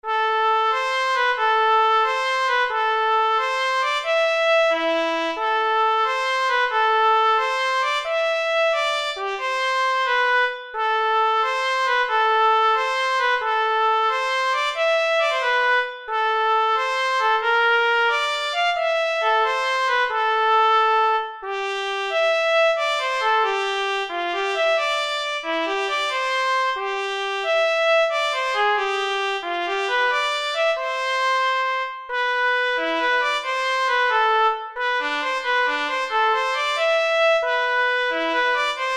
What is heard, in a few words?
trumpet; audition